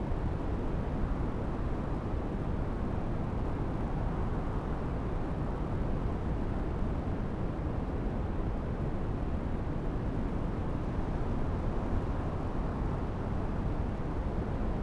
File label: theed Waterfall ambience; Star Wars Obi Wan; XBOX